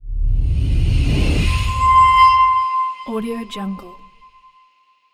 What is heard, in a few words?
creepy game transition whoosh deep reveal